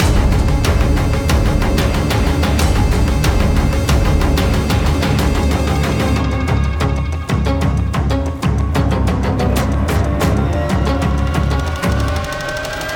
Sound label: Combat